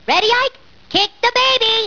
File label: kick